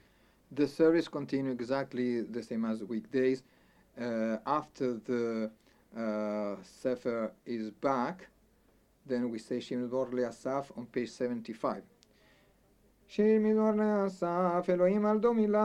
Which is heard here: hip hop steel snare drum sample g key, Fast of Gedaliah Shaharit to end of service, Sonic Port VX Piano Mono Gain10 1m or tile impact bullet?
Fast of Gedaliah Shaharit to end of service